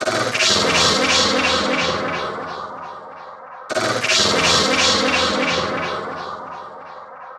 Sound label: Vocal Loop 130, Techno; Techno Sounds 2024; Vocals